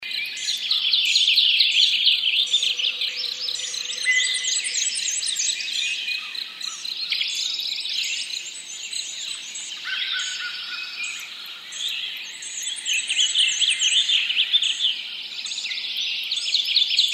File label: Morning Frenzy, ozark mountains